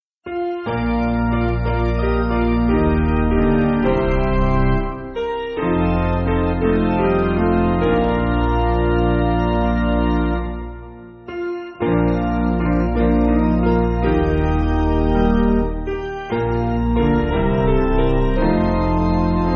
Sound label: Refrain, Piano